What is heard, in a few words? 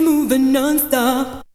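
voice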